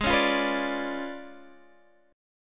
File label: C sharp 7th Flat 9th, G sharp